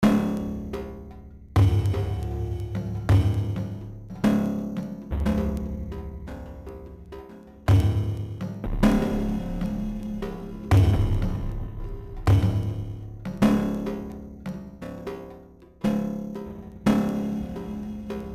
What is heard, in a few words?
Bongo